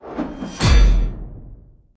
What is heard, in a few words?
SYM Drops; mercy of the gods